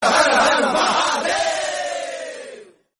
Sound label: sms notification